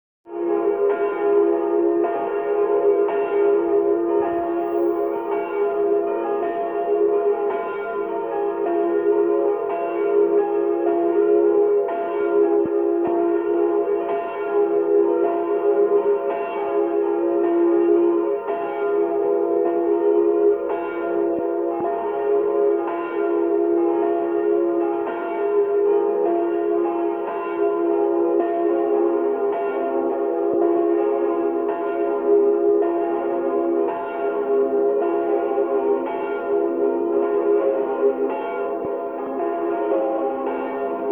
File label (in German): Kirchenglocken; Eriskirch; Mariabrunn